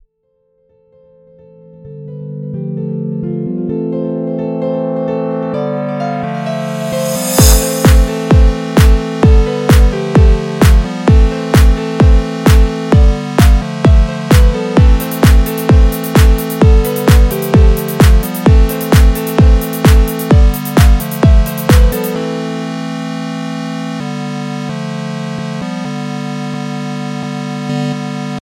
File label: Piano Dance